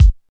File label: raw steel kick drum g key, bass drum single shot tuned to the G note